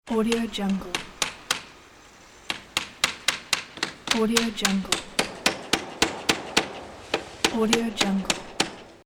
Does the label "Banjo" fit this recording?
No